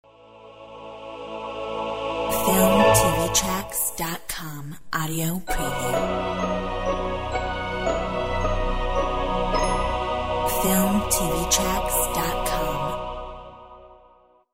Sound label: orch choir; Logopack; Filmtv-tracks